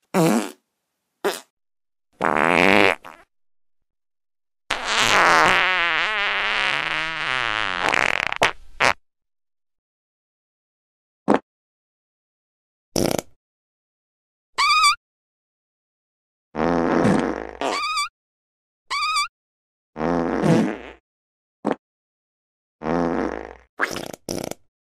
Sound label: Loud Fart Sound Effect Compilation